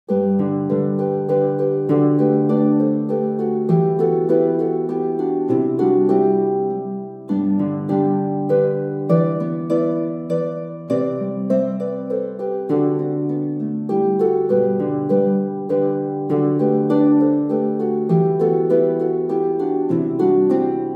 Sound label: Waltz harps